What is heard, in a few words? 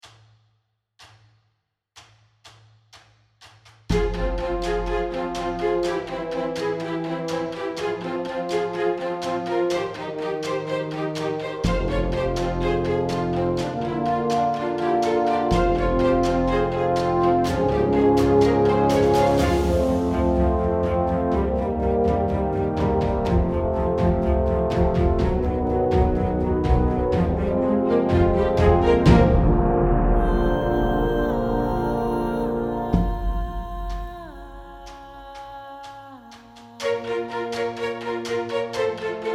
cinematic long tones